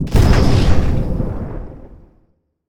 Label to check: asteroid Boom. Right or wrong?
Right